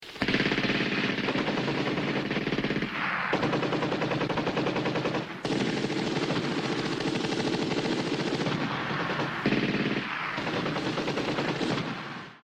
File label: fire